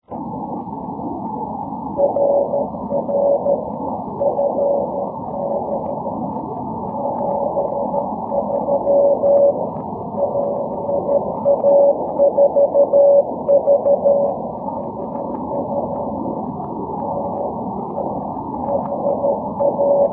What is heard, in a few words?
17m cw